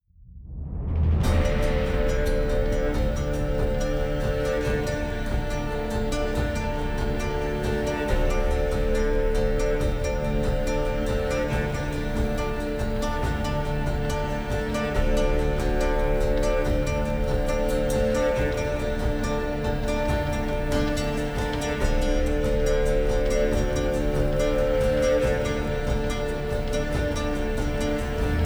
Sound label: Dialog; Q601 Immortal